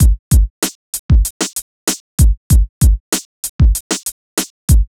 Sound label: Beat